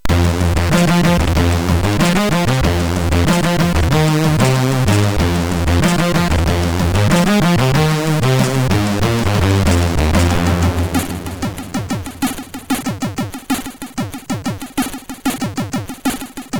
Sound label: Jingle Bass; sid